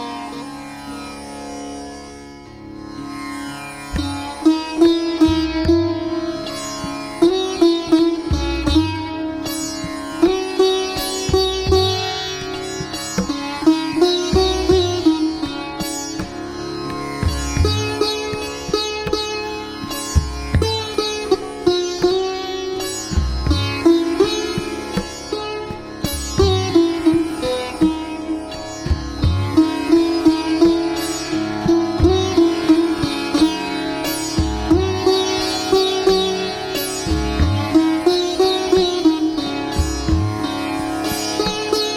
sitar tampura tabla

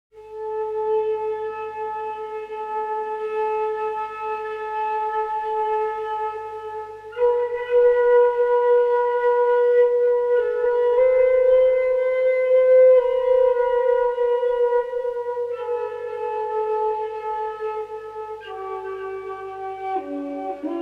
Flute Music

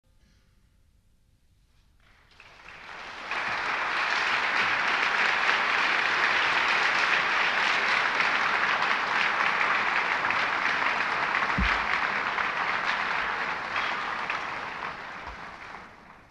aplaus

